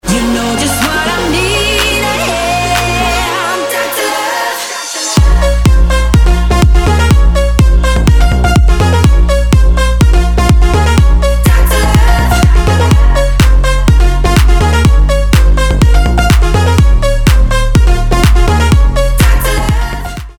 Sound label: Dont Go BEAT